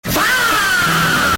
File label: fahh